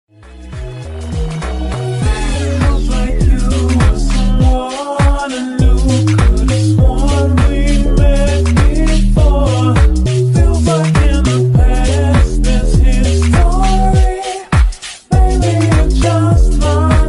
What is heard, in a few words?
Slow